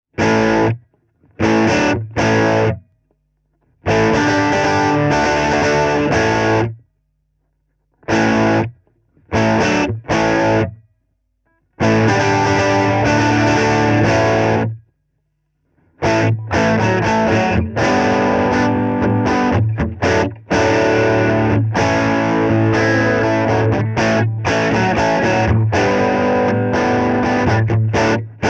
kitarapaketti vain kitarat; Soundtools; demobiisi

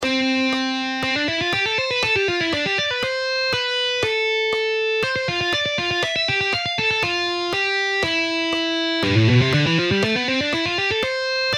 Harjoitussoolo

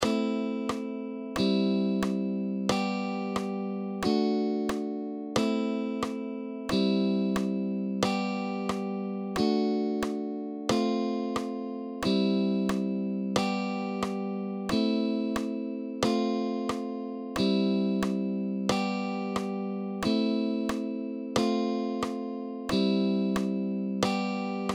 helpposoinnut

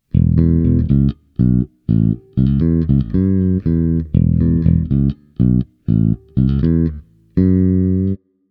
bassot Sterling Ray4; Rockway

